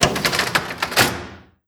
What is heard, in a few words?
Window open